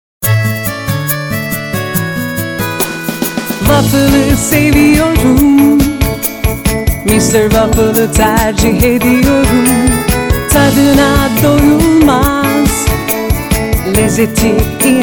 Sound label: jingle; mr waffle